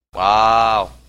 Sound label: uuuooowww